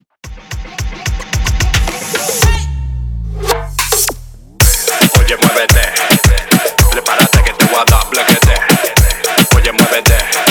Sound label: Moombahton 110 BPM